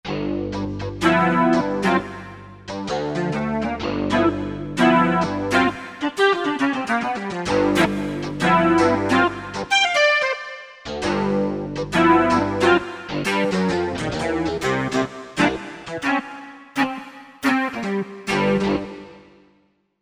Clav, Korg MSC-11S Brass; Barry Clav

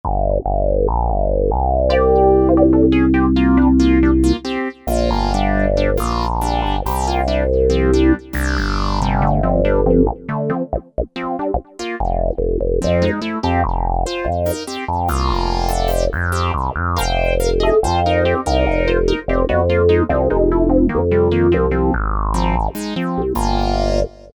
Bass & 5th Lead, Korg MS2000; Factory Patches

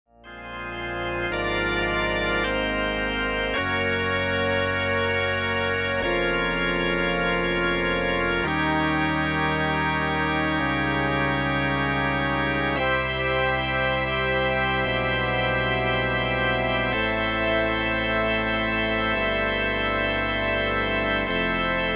Kawai K1m; SANCTUARY

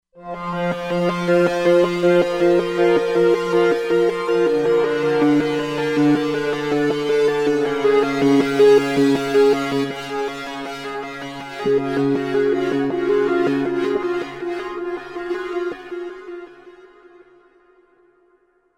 SquareSphere, Roland Fantom XR

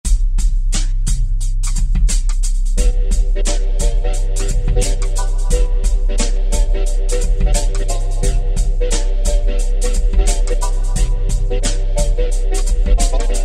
88, Hip Hop; AftrHours; Roland SR-JV80-12 Hip Hop Collection

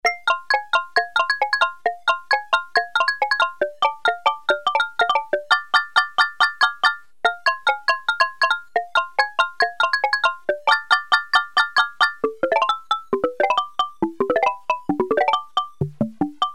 SYNTH BLOCKS

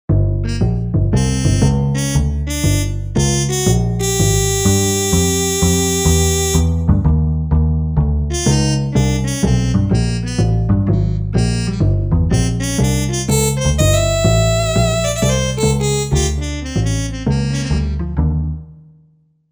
Mute Wood, Korg MSC-1S; Korg MSC-1S Variety

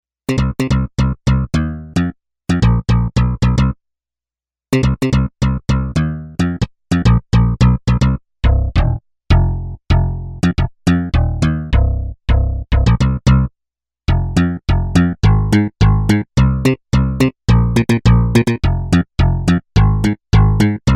DX Funkbass

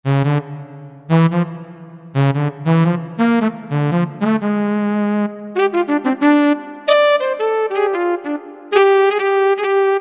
Sax 2; Roland MT-32

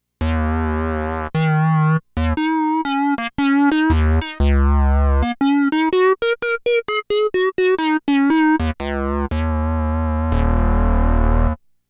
Rezz Reeds

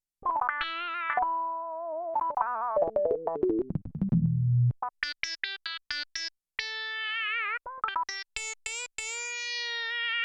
Res Lead, Roland JX-8P

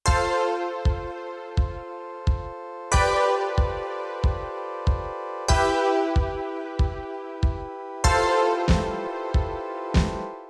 DRUM-SYNTH PAD; Europop Performance 1

